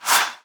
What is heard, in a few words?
basicattack; LoL; varus